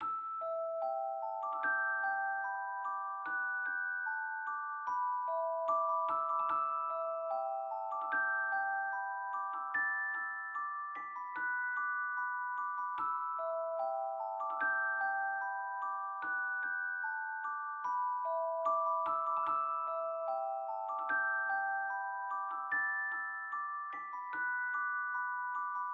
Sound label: Prelude Music Box (Celesta); Fault Milestone Two